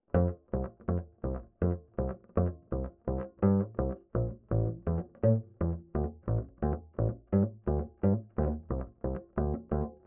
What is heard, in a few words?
Bass Line